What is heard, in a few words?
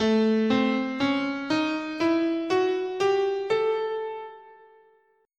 A Hungarian Major, scale